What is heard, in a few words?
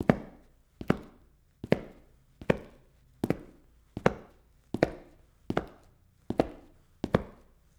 Footsteps Cement; HauntedBloodlines; SFX Producer